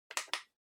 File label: Vacuum Cleaner Button; 掃除機; ボタン